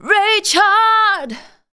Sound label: vox, Techno Trance; Ueberschall